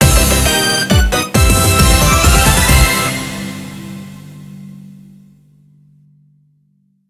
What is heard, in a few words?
Jingle Quest Clear; Megatagmension Blanc + Neptune vs Zombies; ps vita